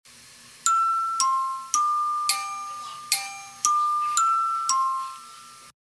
doorbell; gmod; perp2.5

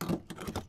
fuel pick up; gmod; svmod

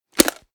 raise drum drumout, reloads; gmod

